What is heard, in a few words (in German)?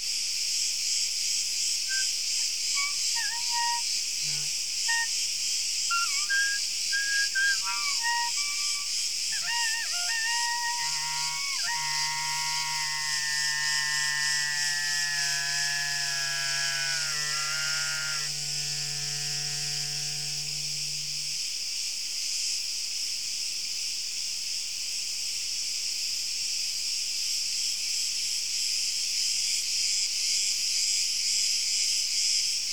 Grillen Frei Ober Ton Rau Spiel